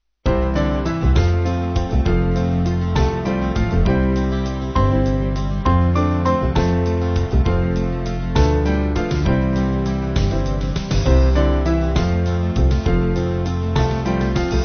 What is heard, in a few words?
Band; Small Band; 3/F-Gb; Cook